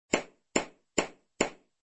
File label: SFX Knight Climbing; Shop Empire 3